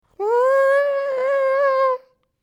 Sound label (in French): chaise métal tiré; Mobilier